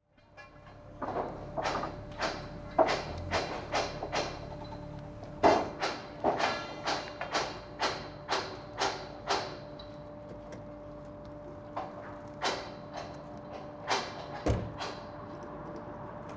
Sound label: group of builders scaffolding a house hammering sliding of poles static electric noise; chatter